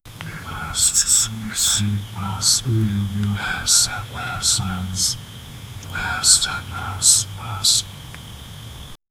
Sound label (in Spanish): murmullos y canticos noct; culture